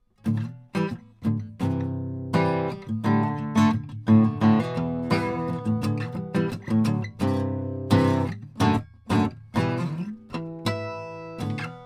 Harmonic; U47fet ARange

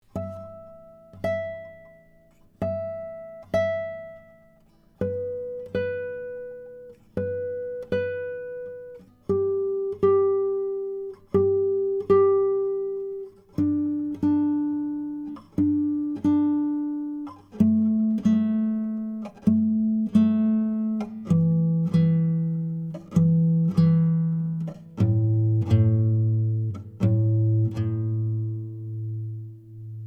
AKG C414, Intonation; BARTOLEX SRC7 GUITAR; C414 COMB

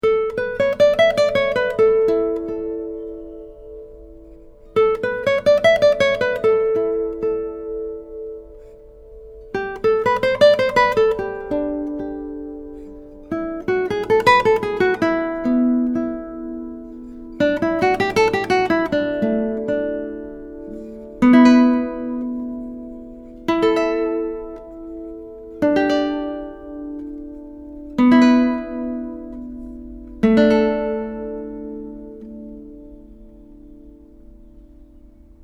Resonance; Bartolex

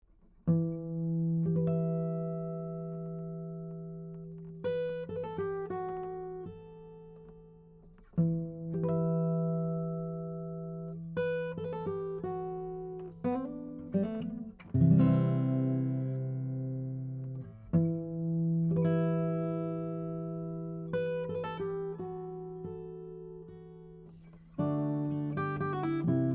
Warm Roundabout, Neumann TLM107

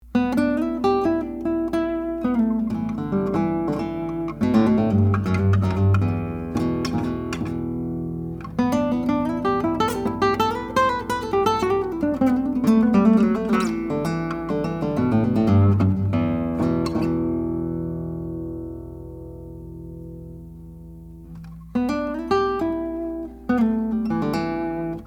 Boogie; original composition

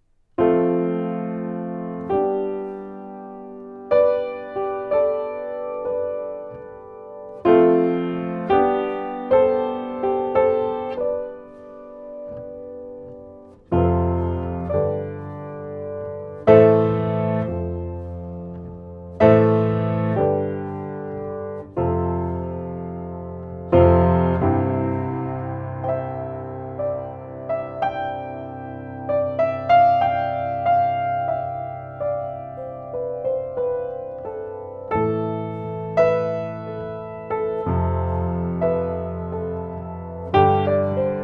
Piano

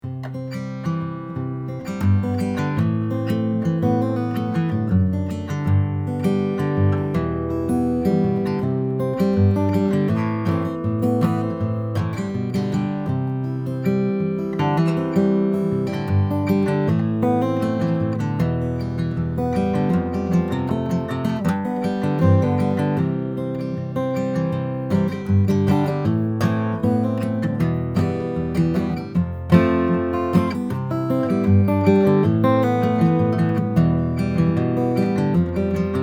Fingerpicking; Neumann KM253

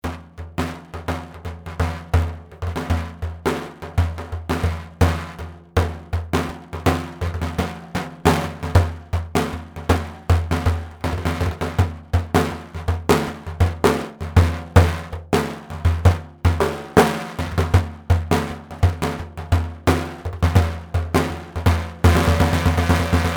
African Drum, Neumann KM84; percussion

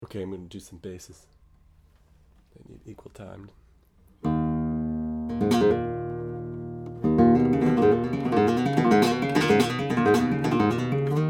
Basses; Ramirez 1970F

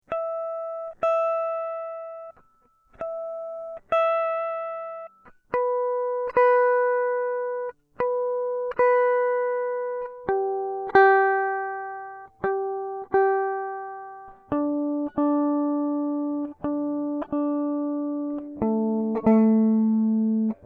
Intonation

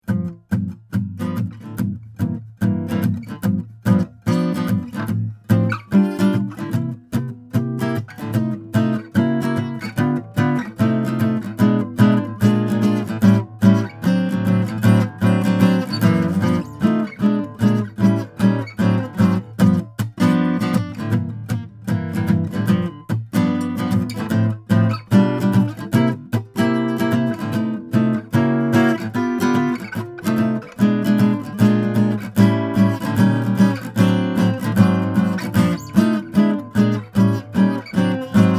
Jazz; Gretch

